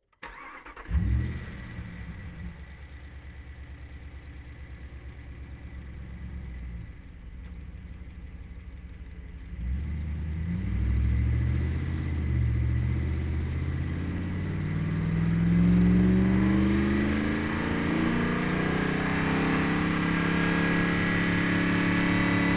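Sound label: dodge ram onboard start drive steady rpms engine; sonniss gdc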